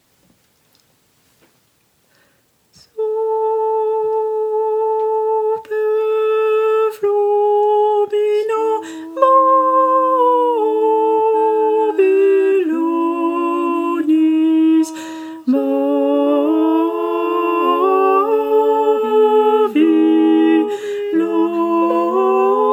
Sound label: basses; Super Flumina Babylonis